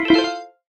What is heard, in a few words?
Jingle Win Synth